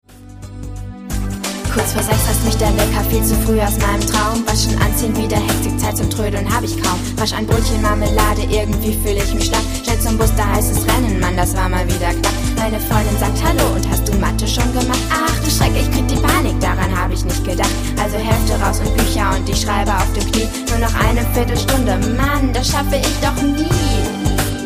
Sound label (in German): keine zeit rap